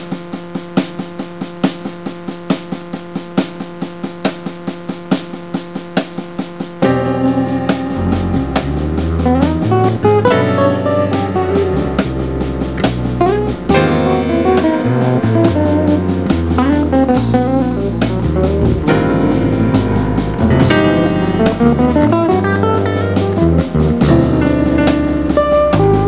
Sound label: bossa